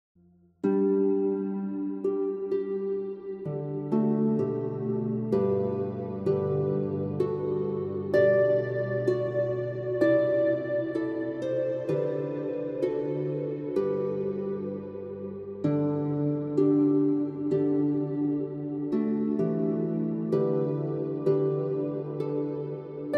christmas music heavenly harp christmas instrumentals relaxing fireplace; CANTARI